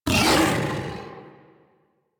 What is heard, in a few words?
Feral Vocal Angry Attack Charge; Warframe; Kubrow